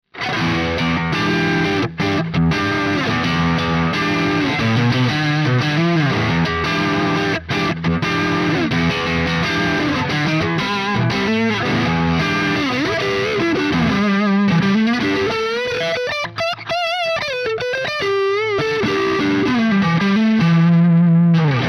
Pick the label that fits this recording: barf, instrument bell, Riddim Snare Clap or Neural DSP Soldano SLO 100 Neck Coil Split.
Neural DSP Soldano SLO 100 Neck Coil Split